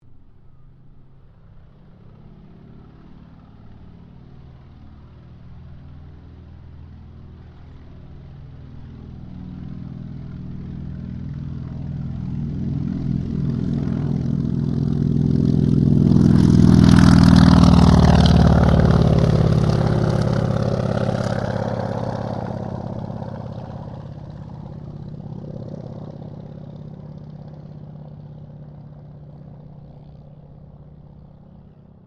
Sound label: AIRCRAFT CAC WIRRAWAY TAKEOFF; CA-16 Wirraway; Airplanes; Vehicles